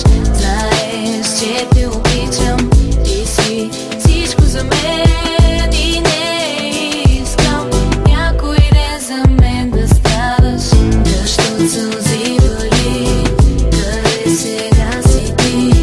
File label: Hip-hop